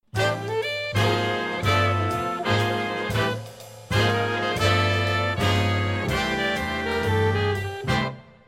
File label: Tutti Writing, Large Jazz Ensemble; Arranging for Large Jazz Ensemble